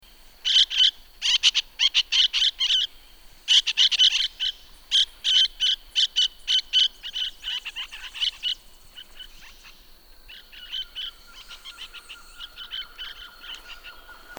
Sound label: Spot-winged Parrotlet